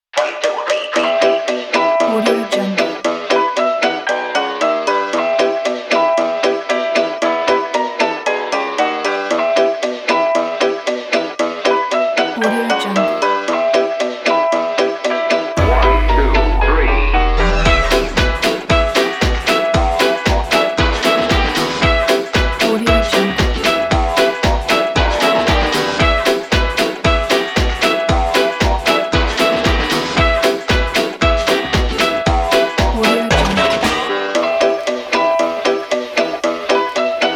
electro swing; charley boom

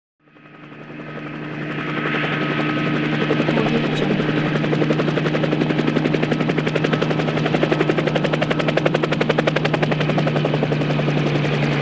jet lands and idles motor; hollywoodedge

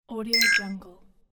hud ui futuristic; volkovsound

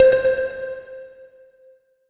ambient game score wooden coin pick up; esmteam

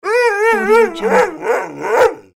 whining dog barking

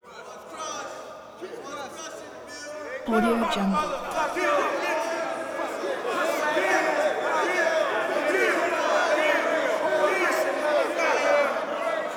prison crowd male nasty angry yelling rowdy; prosoundfx

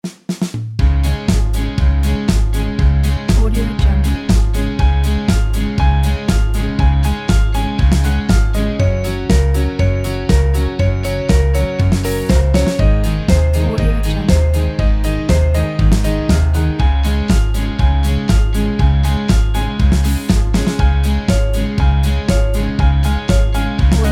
funny piano

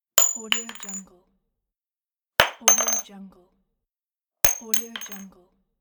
bullet casing fall

